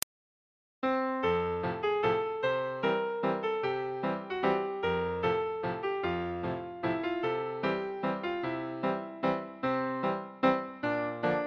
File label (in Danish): harmonika